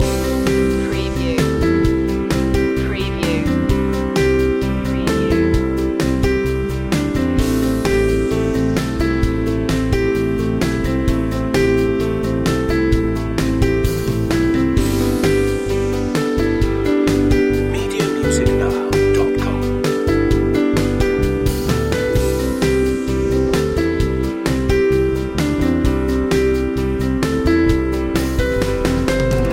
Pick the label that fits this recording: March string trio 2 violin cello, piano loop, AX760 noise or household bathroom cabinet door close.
piano loop